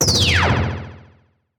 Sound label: Heavy Blaster Shot